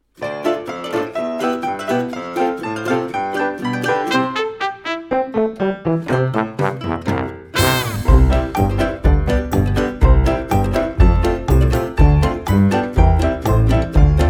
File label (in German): Karaoke Herzlichen Glueckwunsch kleines Huhn, kinderlieder